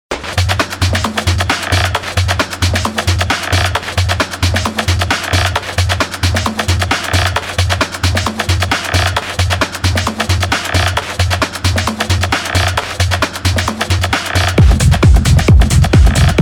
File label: techno